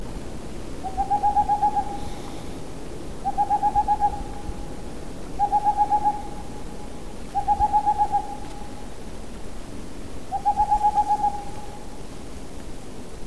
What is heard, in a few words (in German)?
raufusskauz